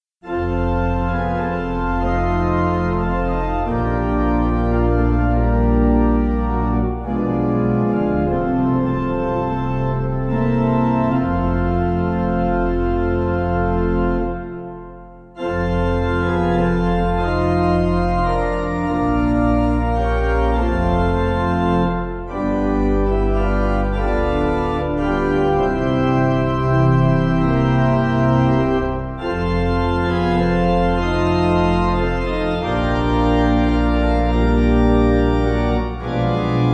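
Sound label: Pipe, Free Music Backing Files for Churches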